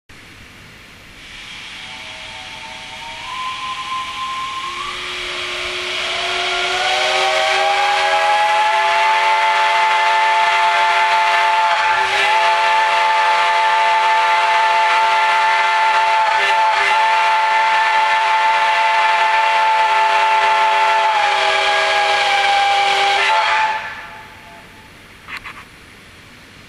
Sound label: Five chime Full Scale Replica